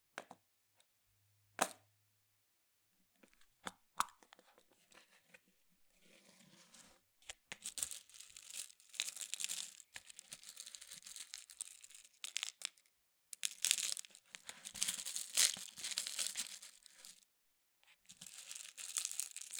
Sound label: Small Plastic Box Of Beads